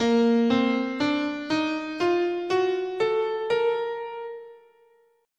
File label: Bb Harmonic Major, scale